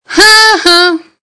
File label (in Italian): ahah